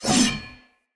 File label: Sword Whoosh Heavy; Weapons; Player; Swords; Fenn Jotar Sword